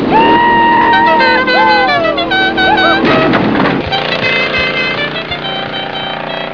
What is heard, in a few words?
dixie horn; redneck